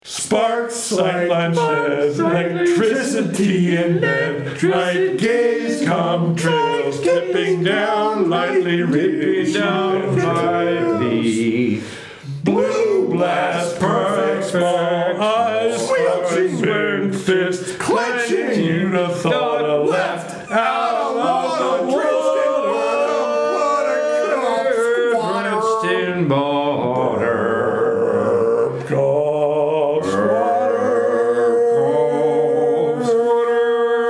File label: Cave a capella